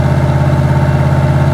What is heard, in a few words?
CADILLAC; XBOX; Enter The Matrix